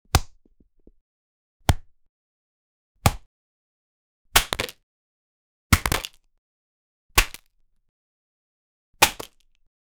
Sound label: powerful toy punch impact, fight